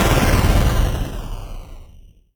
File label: spell quake; Crypt of the NecroDancer